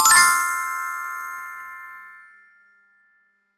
Light Bulb Idea Sound; facts channel

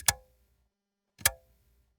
On Off Switch

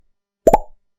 Pop Transition Sound

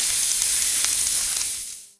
Frying

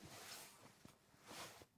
Bedsheet Rustle

